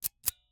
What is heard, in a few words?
Icecream Scoop, metal, mechanical, teeth, gear, squeeze, release; Eiravaein Works; Vaeyan IV